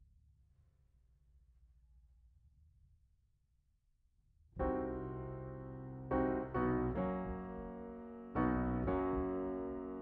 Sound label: Piano Mono